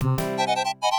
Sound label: Brush